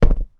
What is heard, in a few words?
foot landing